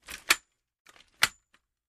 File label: Lawn Chair Fold; seats & stools